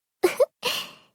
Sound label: giggle